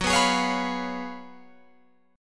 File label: F sharp Major 7th Suspended 2nd Suspended 4th, Guitar, Open F; F#M7sus2sus4; strummed; 18,18,20,20,20,18